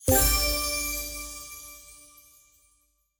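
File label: user interface buy confirm; slots; sRoma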